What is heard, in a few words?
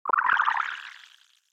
tone